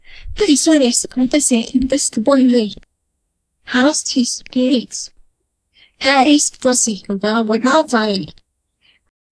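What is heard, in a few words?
most disturbing horror, atonal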